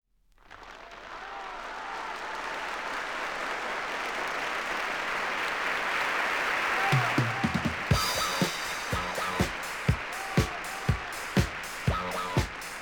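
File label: vocal trio (male), disco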